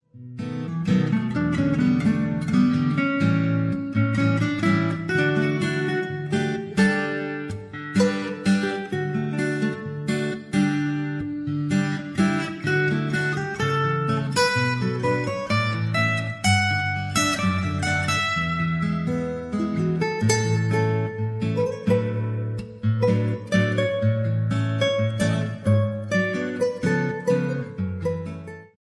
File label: acoustic guitar